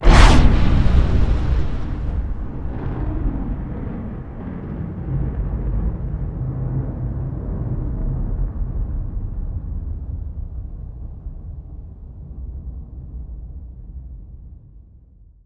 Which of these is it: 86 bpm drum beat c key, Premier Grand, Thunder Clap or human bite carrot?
Thunder Clap